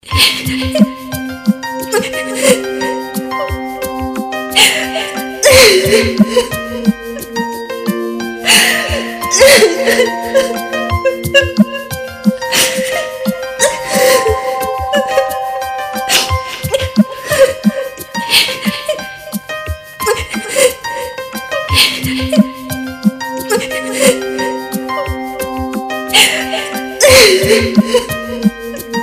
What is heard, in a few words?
crying girl